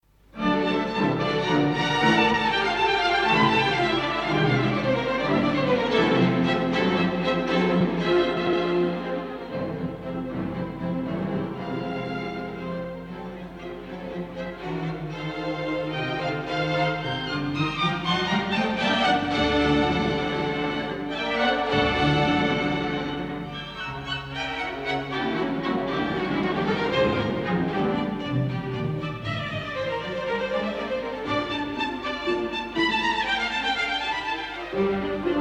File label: prelude for strings